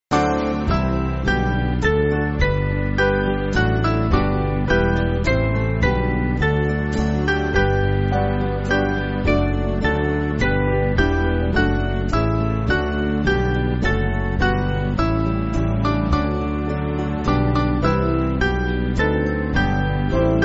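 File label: Band, Music Backing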